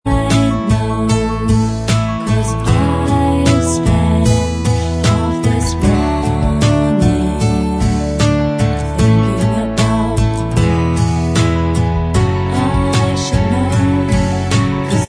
Bvox Track

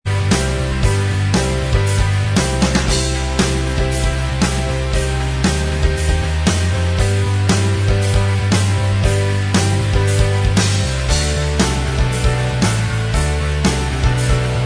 Inst Track

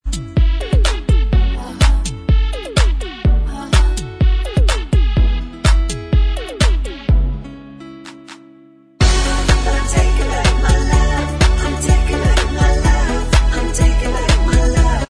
Bvox

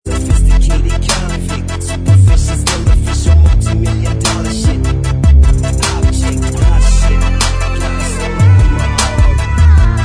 Bvox

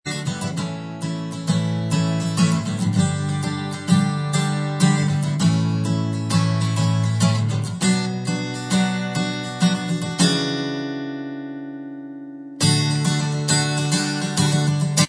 acoustic version Inst

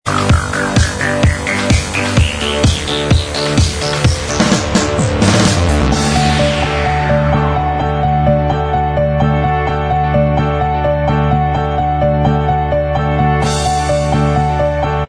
Inst

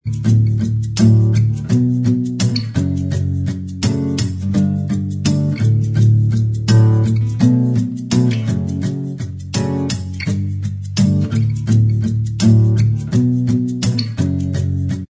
acoustic version Inst

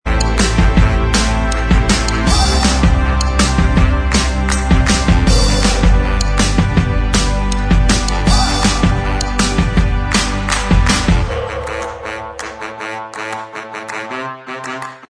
Inst

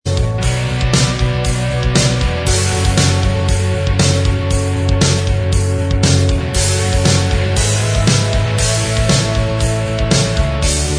Inst Track

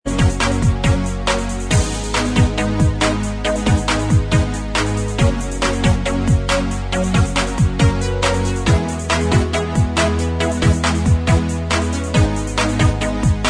Inst

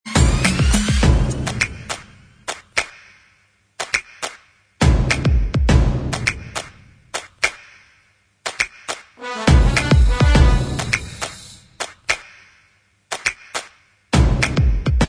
Inst

